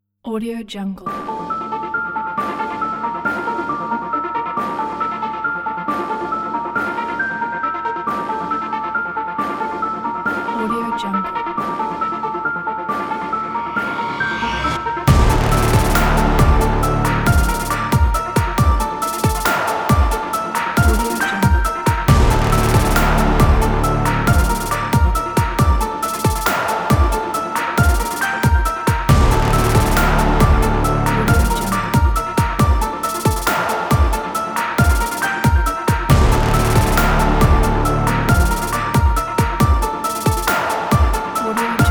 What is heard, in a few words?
suspenseful